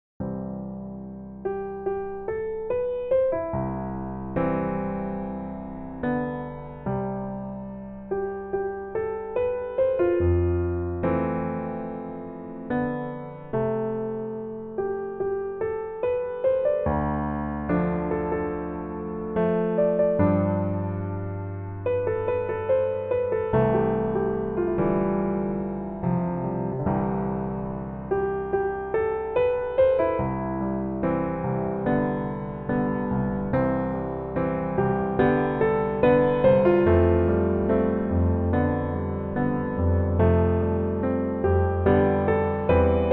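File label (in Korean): Piano version 멜로디MR 남자키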